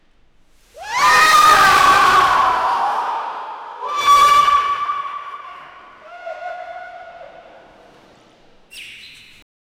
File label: indoor swimming pool pani, panic